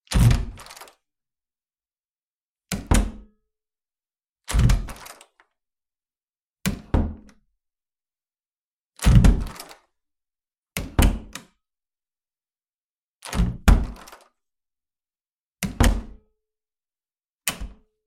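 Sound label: opening and closing doors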